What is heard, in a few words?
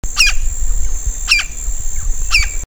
Colaptes campestris